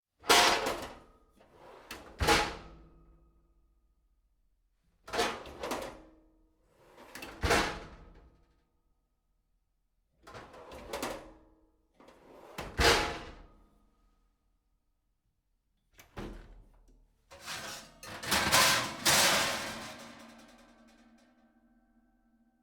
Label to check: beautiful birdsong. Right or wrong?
Wrong